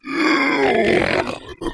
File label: zombie voice idle